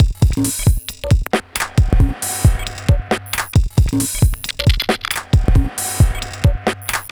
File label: Bpm135 Blitch Break, dubstep drum loops